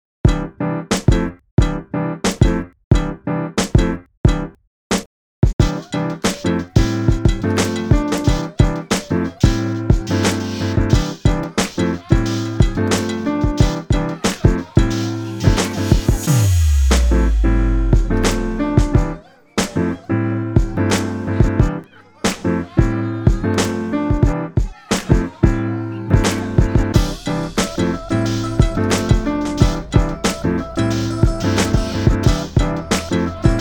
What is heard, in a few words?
Instrumental